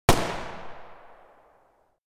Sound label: gunshots